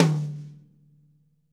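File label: mid tom rimshot; 90s sample CD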